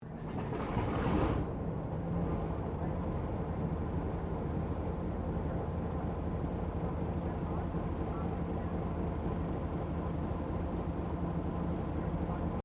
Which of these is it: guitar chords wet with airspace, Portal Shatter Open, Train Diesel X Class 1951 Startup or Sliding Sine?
Train Diesel X Class 1951 Startup